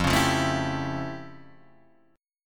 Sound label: F Augmented Major 9th, Guitar, Open D